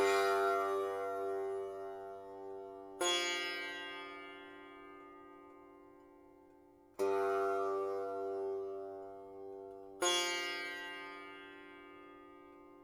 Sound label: sitar